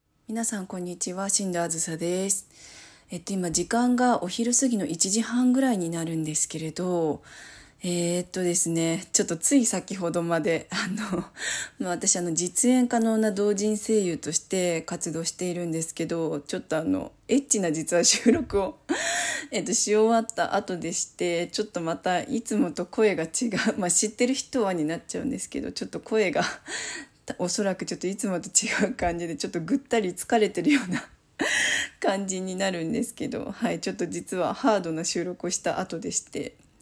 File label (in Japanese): お風呂場でしゃがんでおしっこ